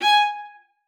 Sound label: VIOLA SPICC G#5; Xsample VOL-5